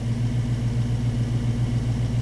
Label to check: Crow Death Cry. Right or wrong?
Wrong